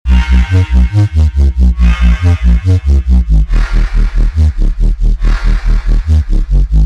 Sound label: 140 Clean D Min